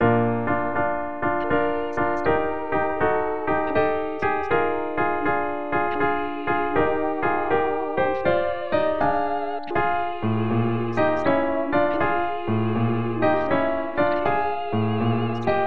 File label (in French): basse mesure 79 114